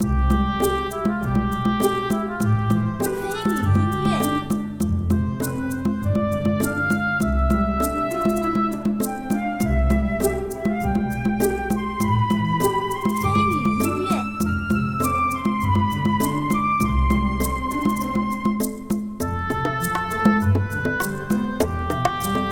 森林

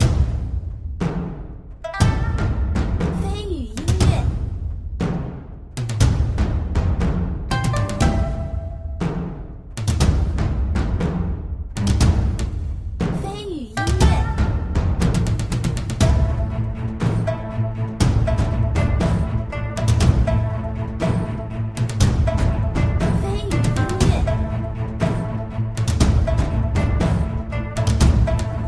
律动-激烈-紧迫

